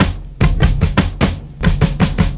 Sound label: PERCS, 70 s Breakbeats